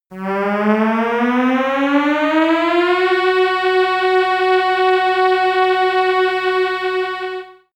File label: alienated racing car